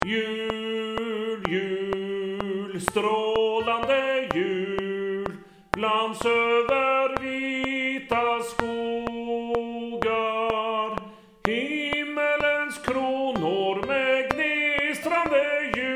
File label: bass 1 click